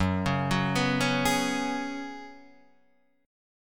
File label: Gb Suspended 2nd Suspended 4th, Guitar Standard; Gbsus2sus4 arpeggiated; 2,4,4,4,2,4